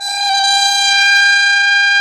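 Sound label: brass plunger trumpet, plunger mute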